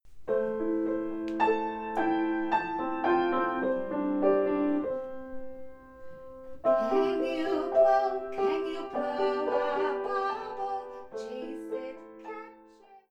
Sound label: Bubble Song